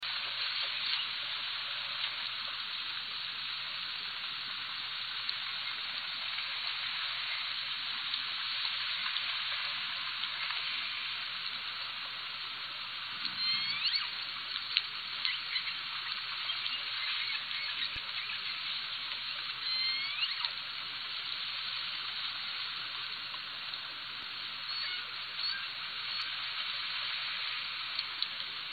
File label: J calls, orca; jeanne hyde